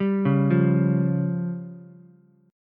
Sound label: C sharp Major Flat 5th, Ukulele, C#Mb5 chord; C#Mb5 arpeggiated; JGuitar